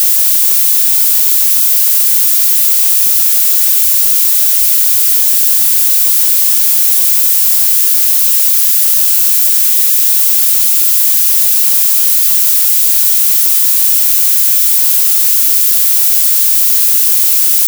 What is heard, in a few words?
Violet Noise